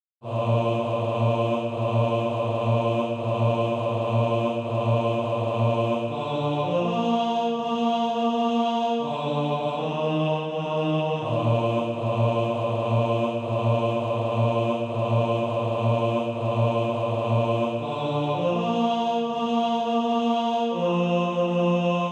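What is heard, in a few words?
bass, Practise Music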